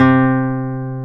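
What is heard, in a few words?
classical guitar, nylon string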